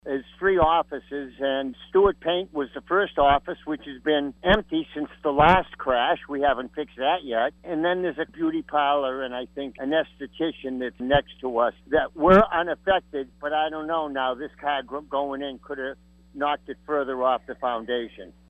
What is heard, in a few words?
brass